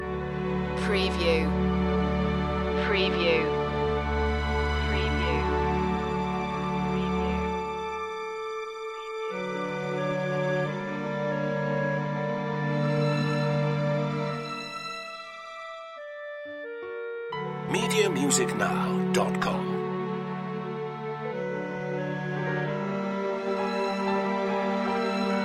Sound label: classic romantic loop